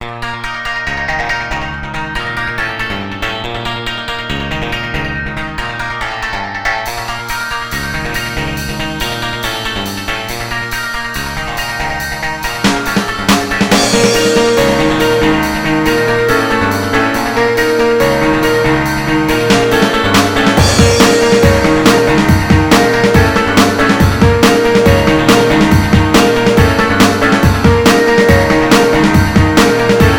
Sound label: funky; demoscene; deadline harakiri; prerendered music